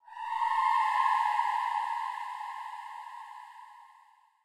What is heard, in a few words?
amb hymns eerie; gamerip; blackfaun